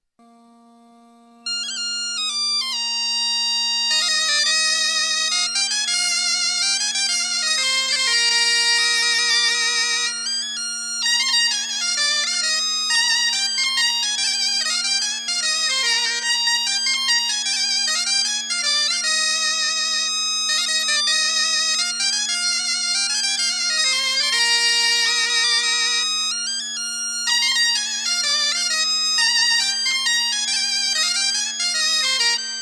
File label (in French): Gavotte Bal